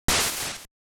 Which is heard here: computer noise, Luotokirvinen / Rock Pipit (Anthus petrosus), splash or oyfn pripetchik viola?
splash